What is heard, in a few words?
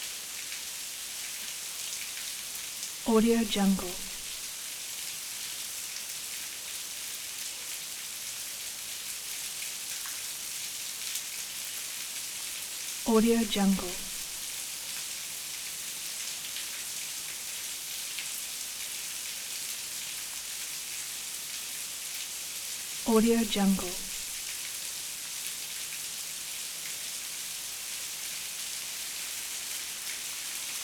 heavy rain